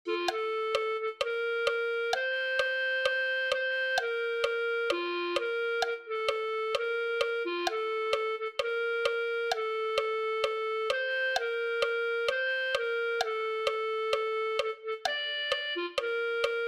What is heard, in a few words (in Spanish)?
clarinete